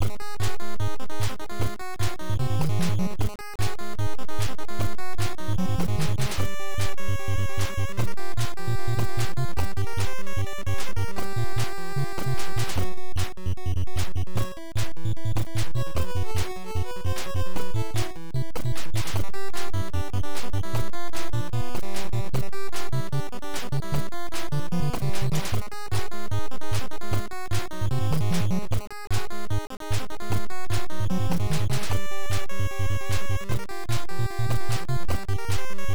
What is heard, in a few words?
Level Theme; Hudson Hawk; NES